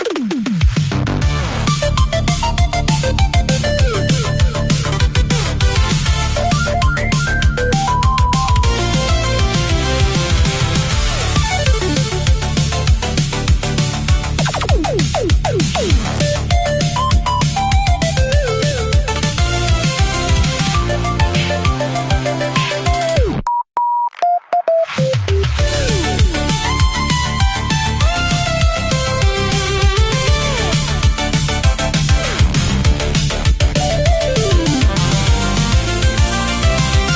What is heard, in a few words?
menu music